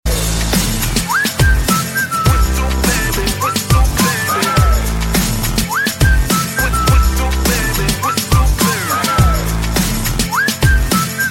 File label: whistle; florida